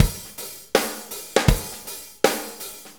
Shuffle Loop, Full Kit Loops

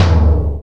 tom A4; Greytsounds; ADD-ONE KITS

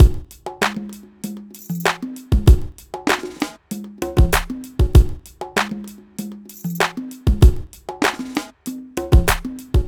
Drums, Hip Hop

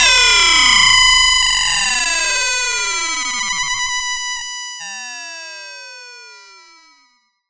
FX, SCI Prophet-5 synth

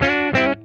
D-A PASS, Funk Guitar; Zero G - Funk Guitar

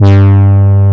HO G2, ANA HRN

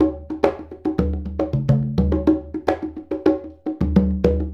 Congas; Essential Percussion

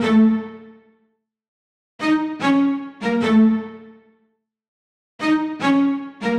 Cellos 75-A, horrorscape samples 75bpm

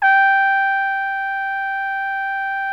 BRASS 2 G4, ORCH BRASS; Greytsounds VOL-1

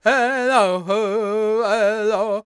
C# MIN, World Voices, LAKOTA

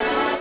JV KIT; Pro-Rec - Synthsonic; JV ANALOG2

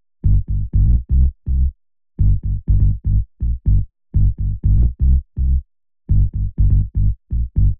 123BPM SUB G-C-G, Bass Loops

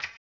HAMBONE, Vocal Blues Soul; Spectrasonics; Planet

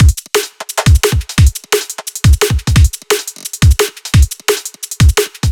Drum Loops, 174; M8; Electronisounds

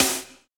Snare GLASS, Snares; Roland L-CDX-01; Snares 3; Sn Modules 3

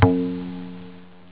funk gt; jazz jackrabbit 2; M8

